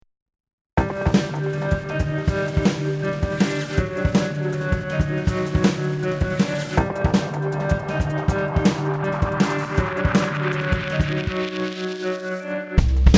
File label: brushed grooves